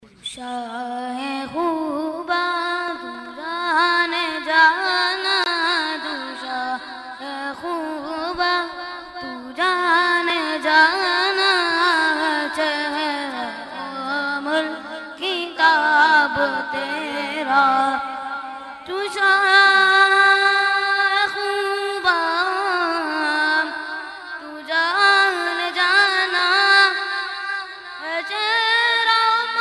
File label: Naat; Salana Urs